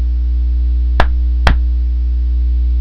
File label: Call